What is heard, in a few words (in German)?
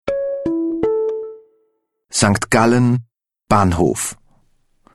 jingle neu; st gallen